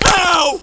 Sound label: fall; homersimpson